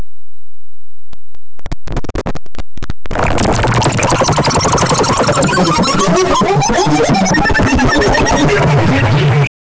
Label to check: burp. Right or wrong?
Wrong